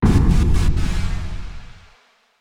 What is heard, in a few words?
grapple sting out